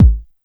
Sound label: HOUSE BD; drum; BASSDRUMS